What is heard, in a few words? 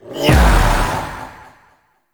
execute heavy strike; Warframe; PaxDuviricus